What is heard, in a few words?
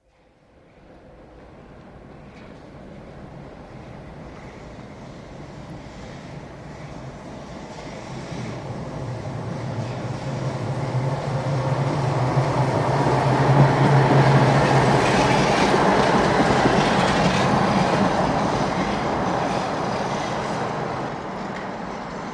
Tram passes right to left; Czech; Train; Trains (Sound Effects:Vehicles); BBC